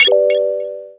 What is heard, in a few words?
correct